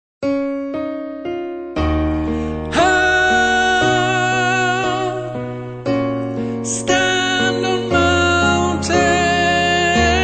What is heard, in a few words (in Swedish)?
tenor, stämmor